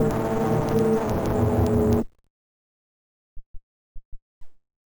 Rando Syn, malfunctioning synth samples, Random Synth Failure